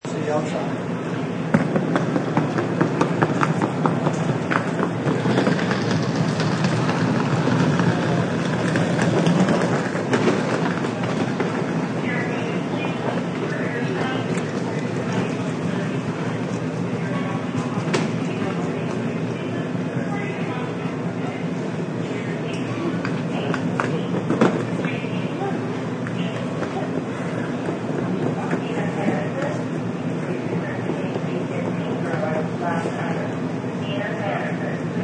Arprt Bgge Clm, Luggage rolling sounds and people talking etc.; Airport Baggage Claim; Airports (Sound Effects:Backgrounds)